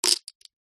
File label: leisure poker chips x2 drop onto pile on wooden felt table